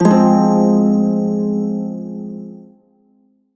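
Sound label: Prime Shawzin Minor Chord, Music; Warframe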